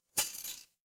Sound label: glass shatter